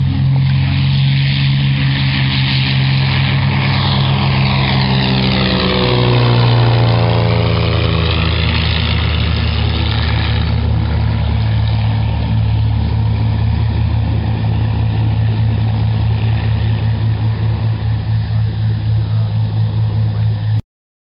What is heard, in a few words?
formation takeoff